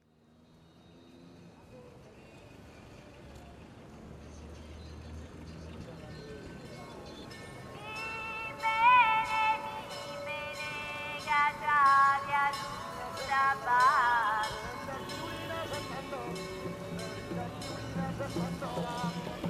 Oceanic Fantasy For Solo Violin And Electronics

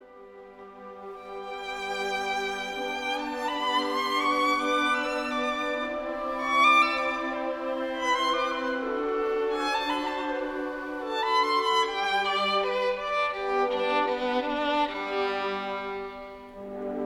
Violin; Beethoven Violin 1; Philharmonia; Giulini

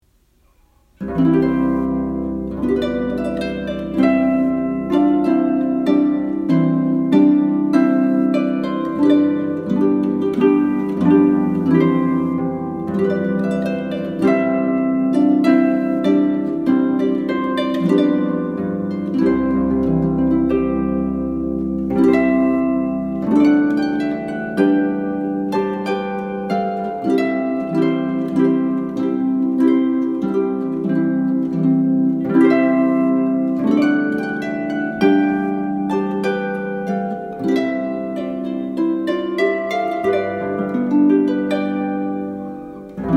Trumpet Voluntary

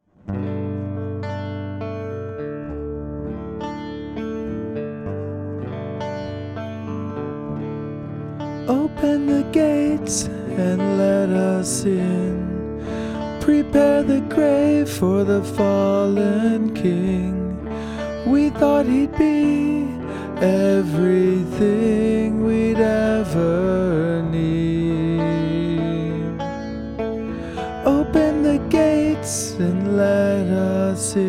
Reprise NO Flute

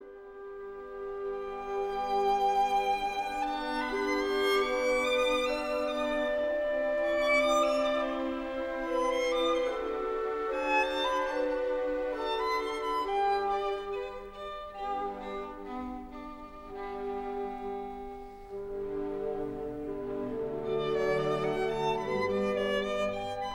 Violin; Beethoven Violin 1; Berlin Philharmonic; Karajan

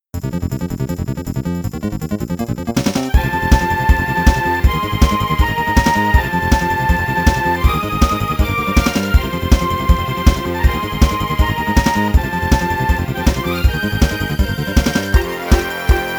Mini Boss; Beard Blade

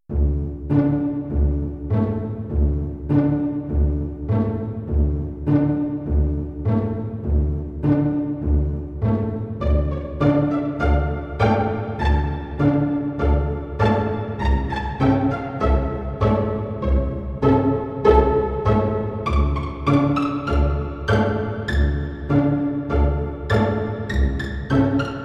Pizz Theme

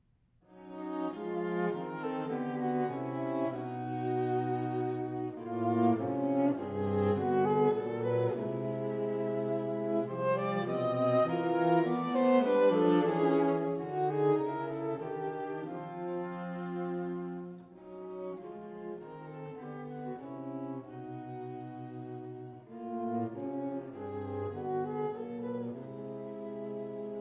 String Quartet, Con moto; Peter Child; String Quartet No. 1

